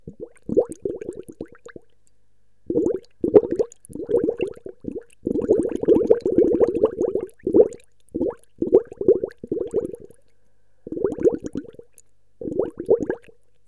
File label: Bubble vase